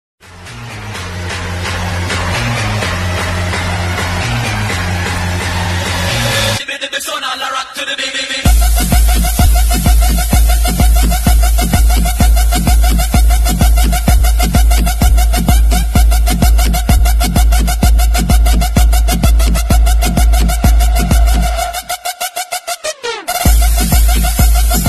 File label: Dj trance mix ringtone